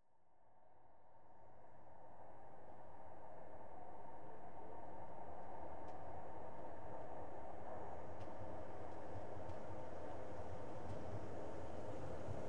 Docklands Light Railway - Exterior - arrives, door opens and shuts, departs; Cities; The BBC Sound Effects Library